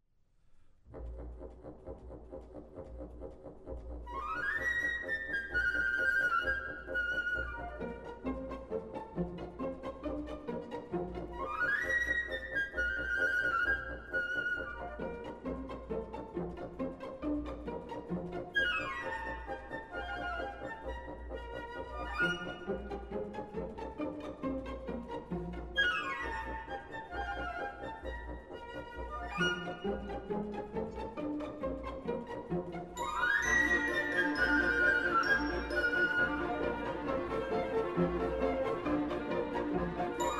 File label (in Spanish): Danza china, orquestra; Cascanueces; L'ORQUESTRA